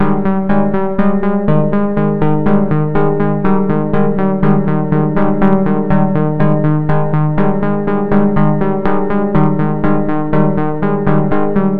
122 BPM Plucks D maj B min Tonic D; mmb studio soundtrack essentials

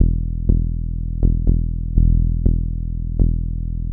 122 BPM Bass Synth A maj F min Tonic E; mmb studio melodic

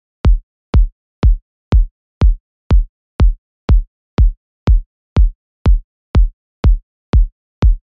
122 BPM Kicks Step 3; mmb studio melodic

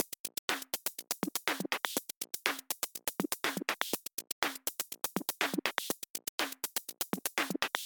122 BPM Micro Drums, percussion; percussion essentials 1

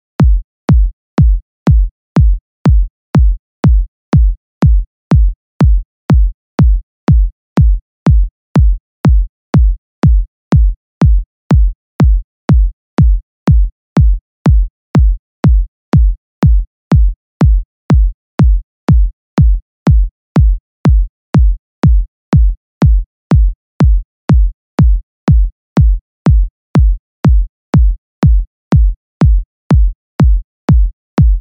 122 BPM Kicks; mmb drum arsenal vol 1

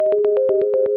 122 BPM Arps Dmaj Cmin Tonic G; arp essentials

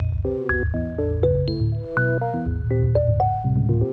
122 BPM Sequence Amaj Fmin Tonic B; innovative sequences

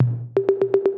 122 BPM Toms, percussion; percussion essentials 1

